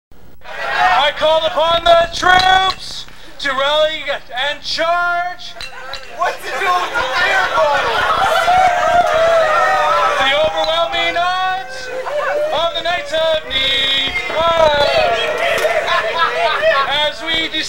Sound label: singing; audio gallery of initiations